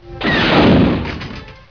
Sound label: laser blast; star wars